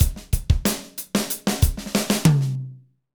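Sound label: Motown, slow Motown, Roland drum beats; Northstar - Drumscapes Roland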